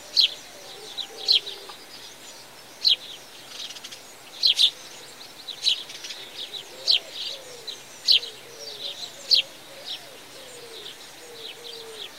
garden, ambiances

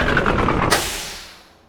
lift down

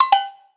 theme success; general comms

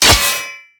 metal, smallclaw combat abilities

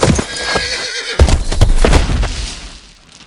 rear, horses

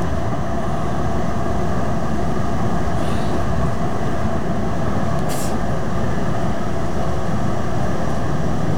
transport vehicle, ambiances

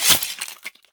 flesh, combat, weapons, swingable; default swingable

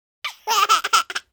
tickle, baby

